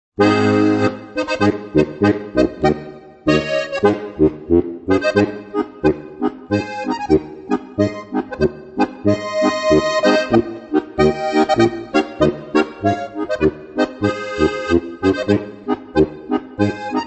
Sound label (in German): marsch